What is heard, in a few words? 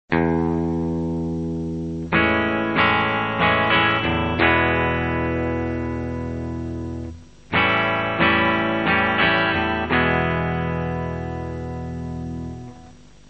iv tension